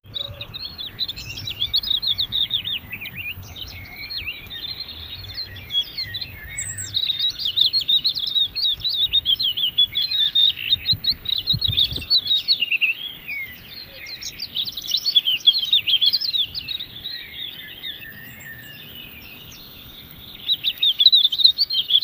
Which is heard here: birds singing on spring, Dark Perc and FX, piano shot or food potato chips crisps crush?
birds singing on spring